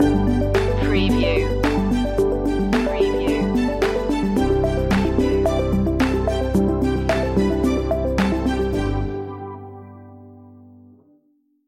corporate dreams loop end

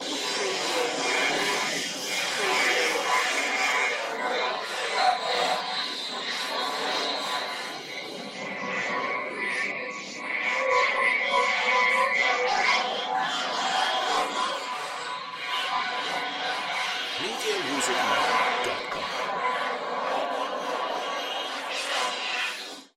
magic dimension ambience

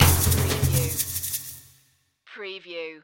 Musical

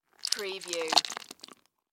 Chew Food Short

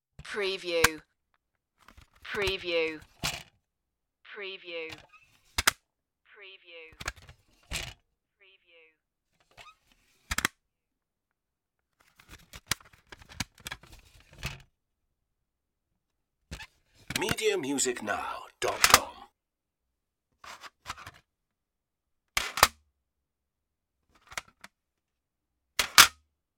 cd sleeve handling

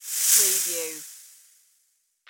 Snake Hiss; sound effects bible

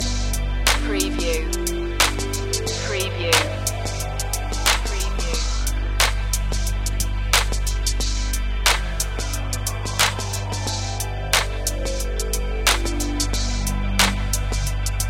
breaking ground loop

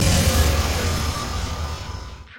Hit Electric

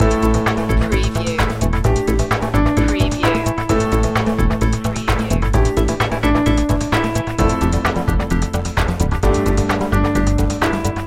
work in progress loop

